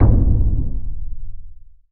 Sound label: lowering, toms; Real